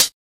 short hat sample B key; hat samples

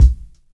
tight kick sound b key

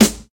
clear snare single hit g sharp key; snare drum samples

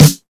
Clean Acoustic Snare D Key, steel snare drum; snare drum samples

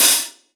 reverb open high hat d sharp key; Duration 0:01

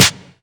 raw acoustic snare b key; snare drum samples

